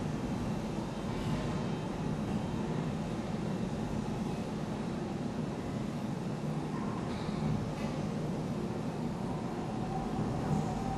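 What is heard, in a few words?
ambience interior Intro Pipe Cave; Boomtown; Titanfall 2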